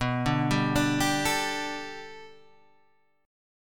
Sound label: B Minor 7th Sharp 5th B Guitar Half Step Down, chord; Bm7#5